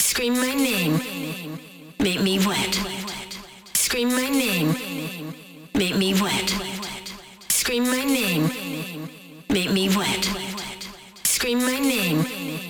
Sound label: Wet Vocal Tool